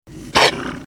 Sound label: horde wolf attack, mobs